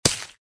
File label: Stab Knife